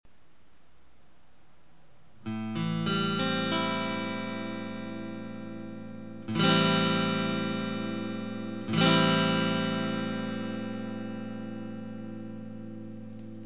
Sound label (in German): a moll7, guitar